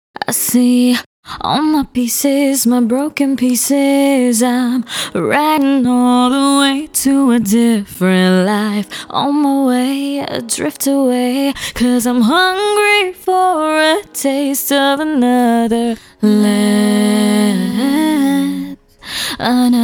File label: pop 120 G